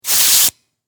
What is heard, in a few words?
Industry Air Hammer Attach Compressor; Hammers; Tools